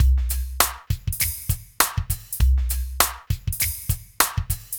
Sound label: Smooth, R&B Drums